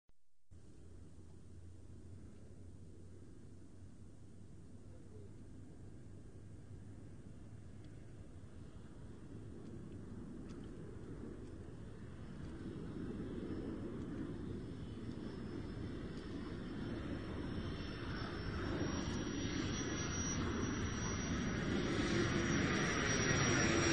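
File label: jet aircraft flyby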